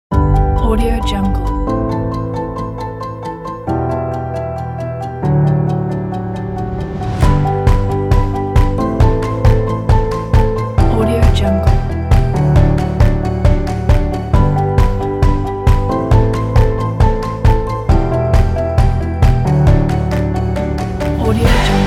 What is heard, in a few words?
motivation showreel